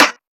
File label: Clap